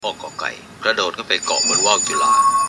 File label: cartoon